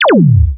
Expire Hi-Tech

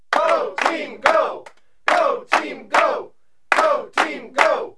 go team, crowds chants; KABOOM! - Wild and Wacky Sound Software

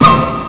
noise hit, chords

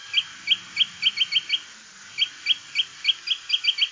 crossbill; Automne

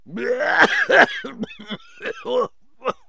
m cough, cartoon, human; Kaboom! Junior

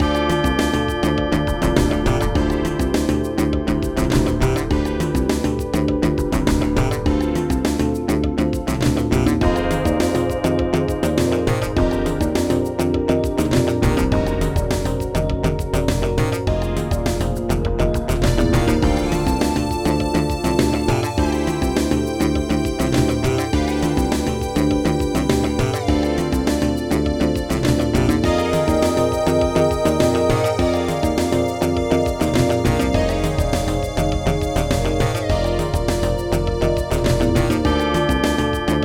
Game Lose; Mundo do CD-ROM 27; train

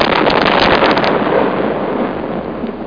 bomb explosion; warzone

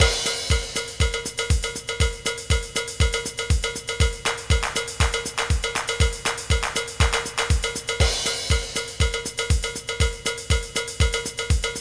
house, drum; Sounds Terrific II; Weird Science

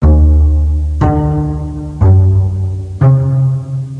BASS MUS

